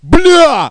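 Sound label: pig pain; DUKE RUS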